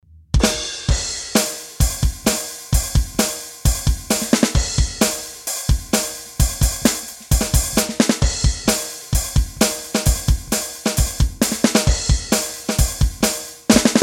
Thomann MPS 850 Kit Rock

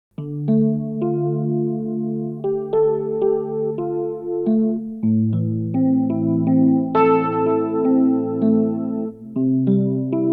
Boss GM-800 Electric Piano

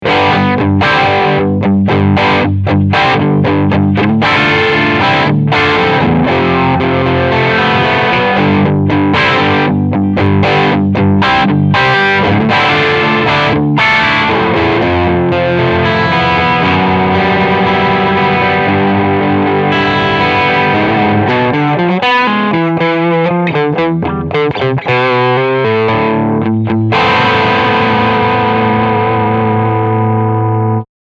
AC Full Gain

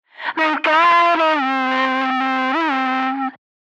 Vocals FILTER MS 20 Vocal Megaphone

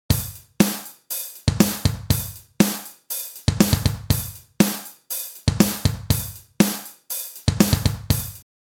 Drum Loop Dry

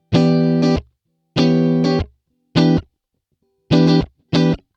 Funky

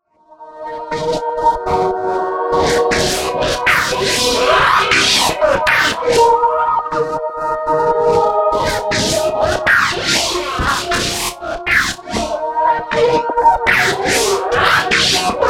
Pads; Mech Encounter

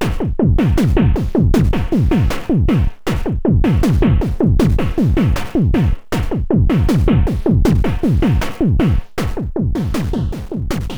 Loops And Drones

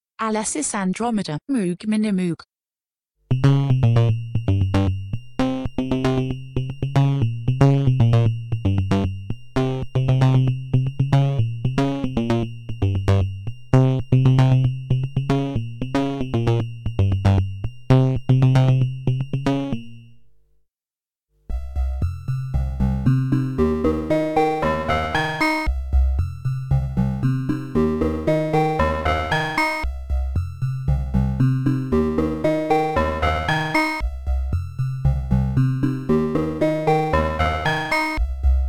A6 Minimoog Sequ With FM

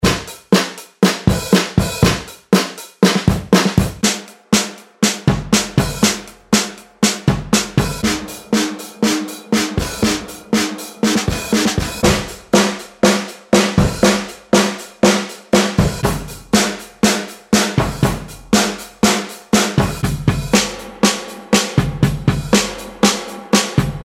Motown

